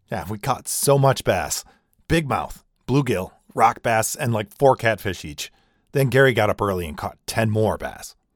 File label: bass